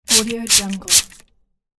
spray bottle

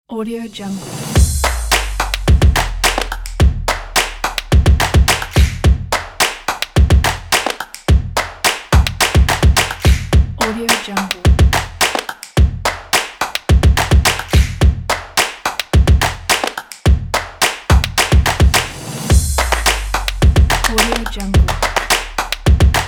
that stomps

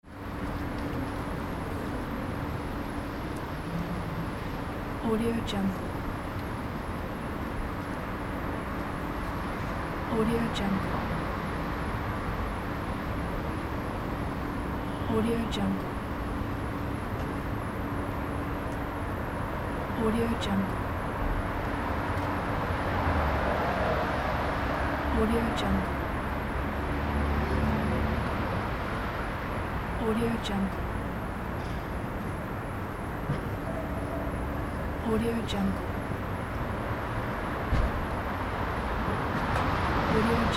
night city ambience; n audio

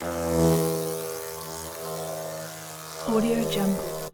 wasp fly; prosoundfx

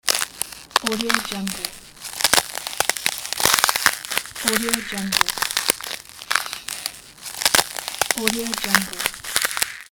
ice crackling

